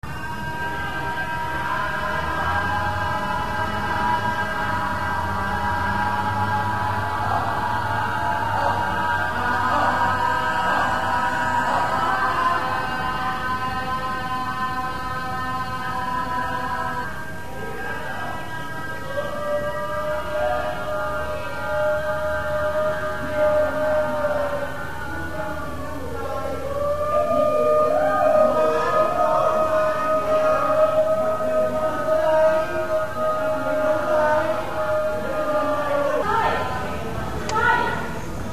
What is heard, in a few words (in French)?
chants harmoniques